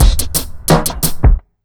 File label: BEAT, Underground Hip Hop